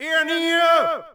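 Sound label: yell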